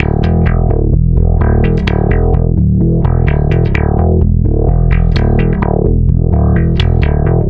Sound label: Stick Loop, Stick Bass